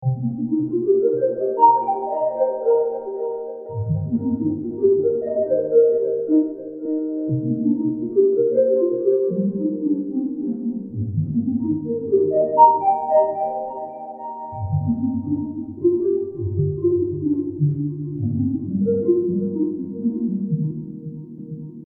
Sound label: let go 66bpm